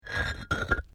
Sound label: PAVING STONE MOVE, dragging